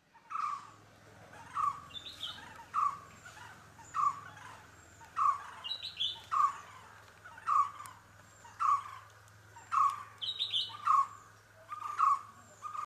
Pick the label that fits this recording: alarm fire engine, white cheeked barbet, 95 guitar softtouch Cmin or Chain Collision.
white cheeked barbet